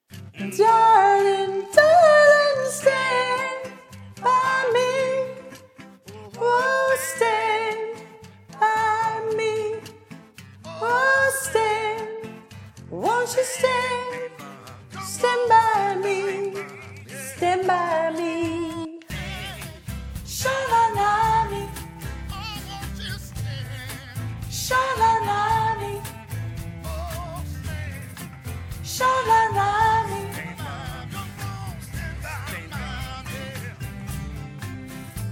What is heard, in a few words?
harmonies